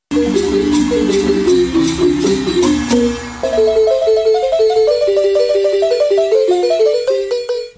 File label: timbila